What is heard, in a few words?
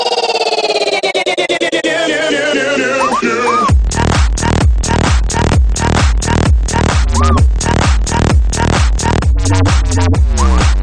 Dirty Electro